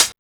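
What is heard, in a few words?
HOUSE HIHAT; E-Lab; X Static Goldmine 1; akai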